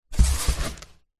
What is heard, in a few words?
foley cardboard box empty pick up from hard ground